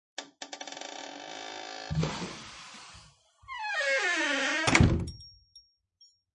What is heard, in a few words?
Wooden Door Close With Door Creak; Creaky Door Sound Effects; Lynne Music